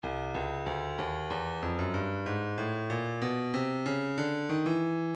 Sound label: breakbeat 094 bpm; Stylus; Vinyl Analysis